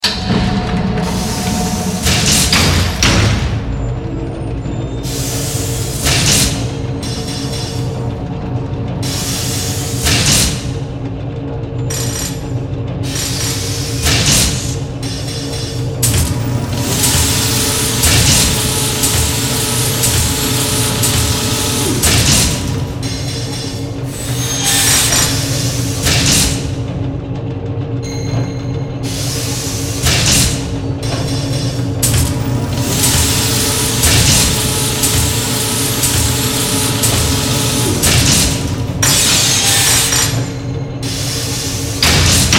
Factory Mechanical, Factory, Mechanical, Assembly; 5.1; Sound Effects:Science Fiction; BlastWave

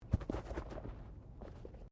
Body movement Foot Foley

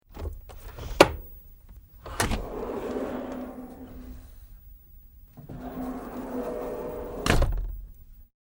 Sliding Door; Various slides open and close; internal large door; Sliding Doors; Tom Hutchings

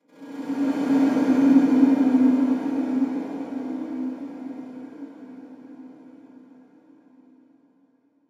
Sweeper Ghostly Reverb Swell; Blastwave; Production Element Imaging Element Accent Transition

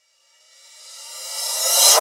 CRESCENDO CYMB, Crescendo Cymbal Reverse Flange

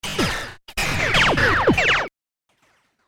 Laser Blast; Laser Gun Sound Effects; science fiction sound effect; Andrew Fleming; Twistedtracks

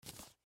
Envelope Pick Up; Paper; Office; Blastwave

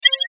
Mouseover Musical Stab; Multimedia; Blastwave

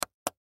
Laptop Mouse Pad, Laptop Mouse Pad Press Single; Sony Vaio Computer; Technology; Computers; Blastwave

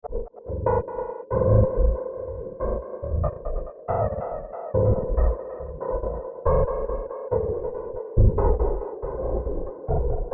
Alien Spider Lair; Alien Sound Effects; science fiction sound effect

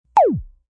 Lazer Sound; Laser Sound

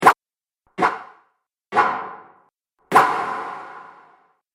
Sword Swooshes Dry And Reverb; Twistedtracks

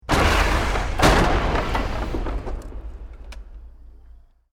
EXPLOSION MEDIUM WOOD; Created explosion effects, house, building or vehicle effects; Bombs; Bomb Sound Effects

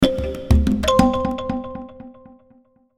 Logo Hand Drums Kalimba Delay; Sonic Logo; Blastwave

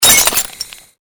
Created Magic Spell Ice Bolt Impact

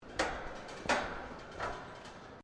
METAL IMPACT CLANK; Metal Sound Effects; Metal (Sound Effects:Materials)

